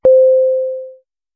Electronic Chime; KevanGC; audiobible; Blind Survival

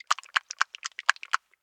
Antidote Bottle Shake; Medical; Blastwave

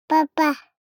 BABIES TALK Boy 12 months Baba; Baby Boom

